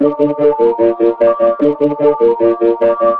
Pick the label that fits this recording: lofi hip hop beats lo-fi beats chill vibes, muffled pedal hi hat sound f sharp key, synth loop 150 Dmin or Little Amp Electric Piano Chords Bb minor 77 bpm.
synth loop 150 Dmin